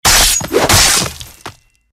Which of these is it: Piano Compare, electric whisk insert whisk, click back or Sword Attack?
Sword Attack